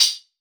Solid Glassy Hard, Hats; Airwindows

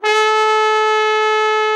Trombone; Sound & Vision - Gigapack II CD 1

